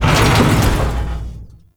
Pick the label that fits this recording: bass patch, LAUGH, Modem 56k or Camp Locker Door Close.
Camp Locker Door Close